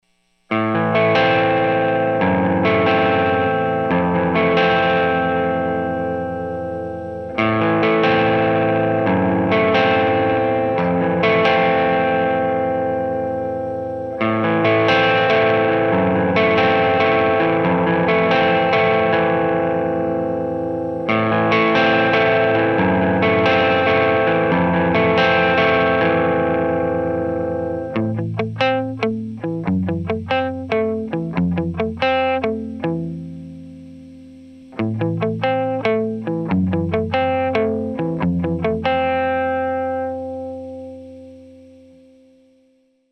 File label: AMP Dirty Gemini 5 FET, dirty eguitar